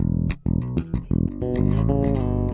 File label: 094 chord the bass, bass loops